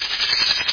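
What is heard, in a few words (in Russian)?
маракас перезвон быстрый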